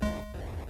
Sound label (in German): ziegel aufheben; gamesoundopedia